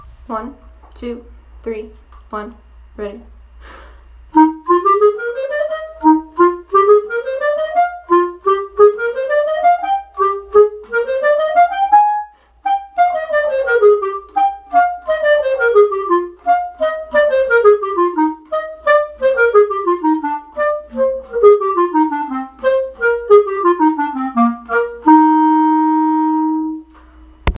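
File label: trombone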